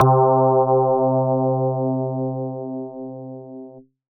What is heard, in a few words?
Attack Organ C4, Old Skool House